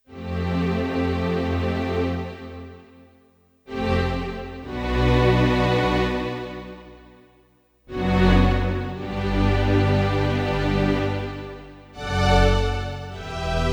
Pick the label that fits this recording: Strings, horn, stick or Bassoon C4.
Strings